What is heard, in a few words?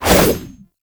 Bayonet Melee Combo; Warframe; Tenno